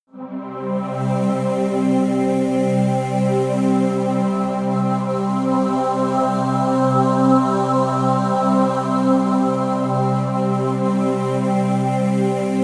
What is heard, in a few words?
pad sweep choir; flaechen